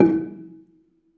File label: BELA E3, BELAFON, Asian Traditions; USB Soundscan vol.60; AKAI